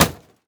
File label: plastic hit; teardown ps5 windows xbox series xs gamerip 2022